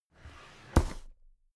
drawer cabinet carton open heavy

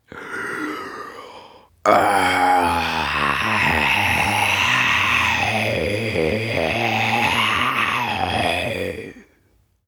zombie male growl inhale exhale throat

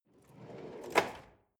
drawer large draw open lock

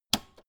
old button press jump out fast

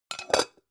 pan close hard

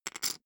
poker chip stack small rattle

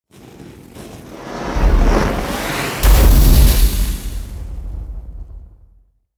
burst

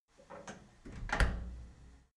cleaning machine close handle slow

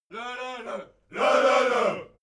army song segment

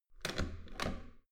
metal door heavy handle use

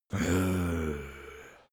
zombie moan idle

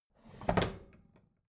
bathroom drawer close regular

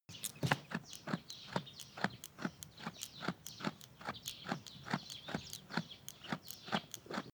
goat chew

